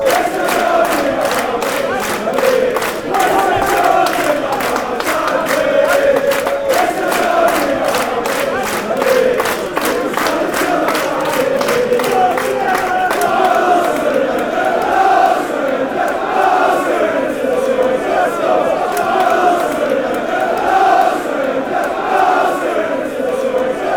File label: accrington stanley, chants